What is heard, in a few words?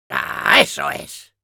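Demoman pain sharp, Spanish; "Aaaa, ¡eso es!"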